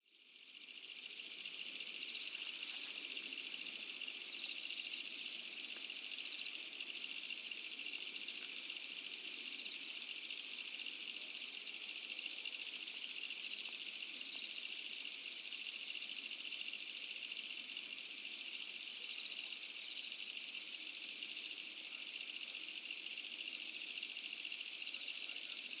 AMBIENCE Insects Medium Night Summer Distant Village, Asian Countrysides; SmartSoundFX